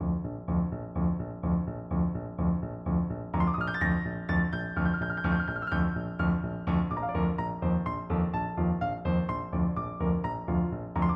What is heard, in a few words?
Chinese Dance PNO